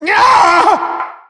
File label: headbomb hit